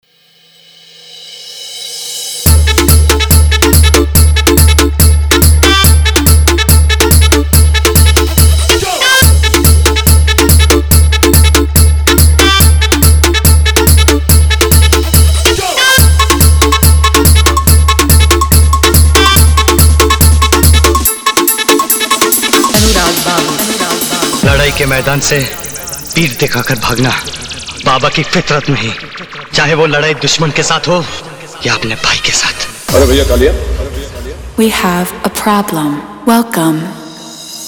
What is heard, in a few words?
Sound Check Remix